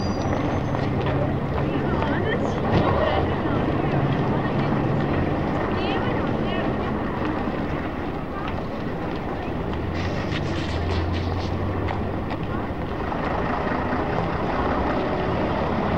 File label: Factory workers